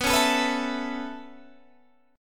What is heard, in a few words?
B 7th Suspended 2nd Suspended 4th down Guitar Standard, chord; strummed; capo=7